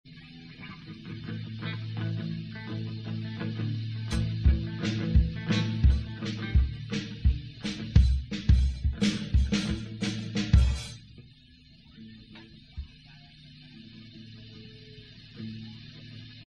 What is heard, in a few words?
rehearsal